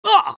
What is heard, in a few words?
pain sharp; garrysmod; tf2-content-gmodcontent